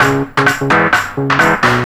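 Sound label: JUPITER LOOP, Techno Trance; E-MU Formula 4000 Series Vol. 2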